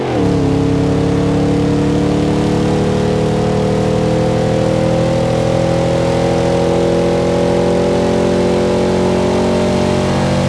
third, vehicles; cadescalade

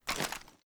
ar drop, weapons; gmod; viper

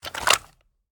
wpn gbl plr inspect settle; viper

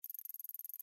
ticks, pause menu; gmod; paris

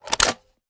reload magout, weapons; gmod; viper

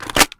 reload empty fast waffle mag in; reloads; gmod; iw8

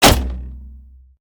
bullet small crossbow bolt; gmod; viper

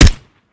mike4 sup, weapons; gmod; viper

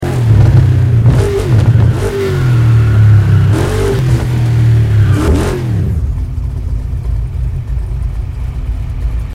shelby throttle off fast loop; vehicles

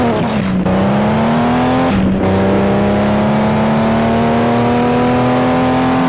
second, vehicles

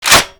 m203 chamber close, foley; gmod; viper

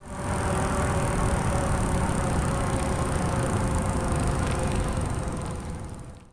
throttle off, vehicles; gtav

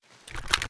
reload raise; reloads; gmod; iw8

